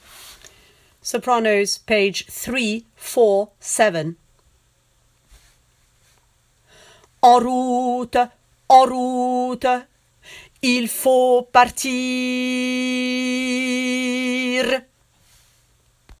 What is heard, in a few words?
tenor; Carmen